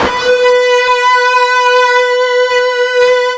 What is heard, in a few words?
FUZZ B 3, ULTRA FUZZ, Guitar; East West; Steve Stevens Guitar Samples Collection